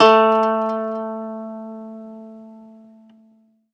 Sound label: ZITHER A, Alpine Volksmusik